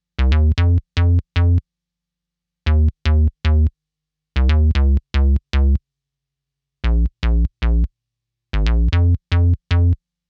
Roland SH 101 Bass

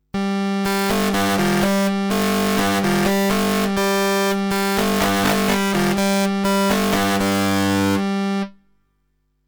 FMFX Self FM; WAVeBOY Industries

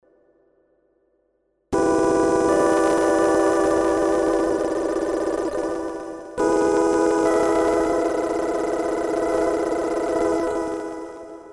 Loop Position Modulation

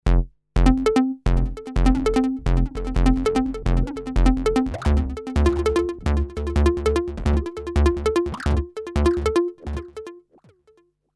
ENSONIQ EQ DDL

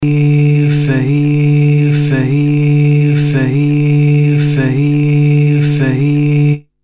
Reverse Cross Fade Loop

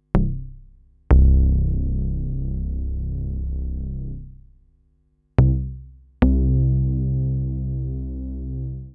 BASS COTK Model 15

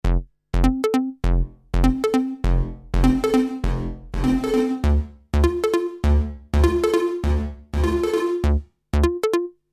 ENSONIQ Perc Plate

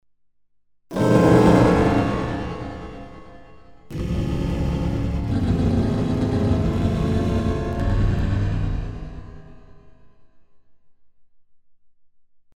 Pitch Delay

